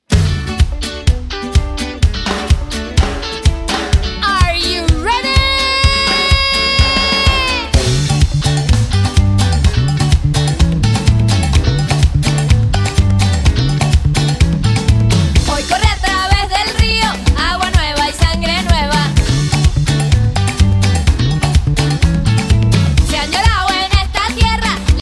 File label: okarina; sol